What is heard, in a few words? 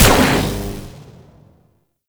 Experiment Pistol Shot, Weapons; Warframe; Corpus; Sent Experimental Pistol